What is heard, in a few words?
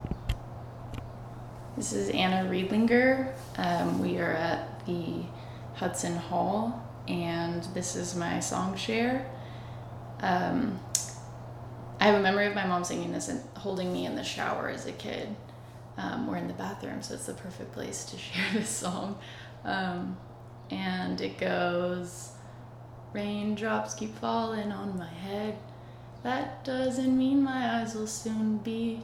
RAINDROPS SONG; oral histories